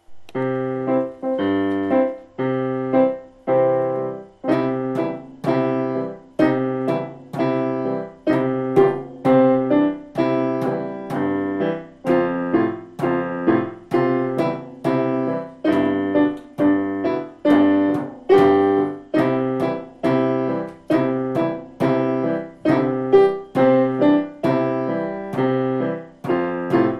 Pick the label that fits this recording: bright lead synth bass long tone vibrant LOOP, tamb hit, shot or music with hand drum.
music with hand drum